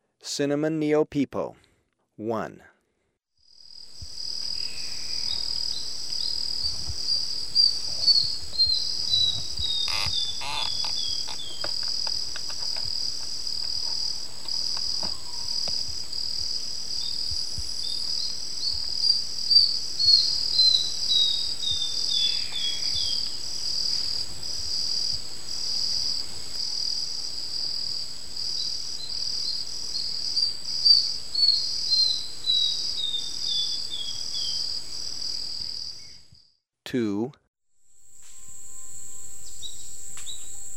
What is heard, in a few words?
Cinnamon Neopipo, Birds of Ecuador; S. America